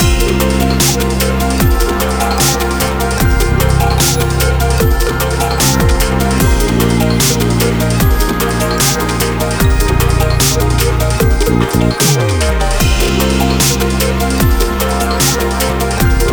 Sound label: Bgm Cardout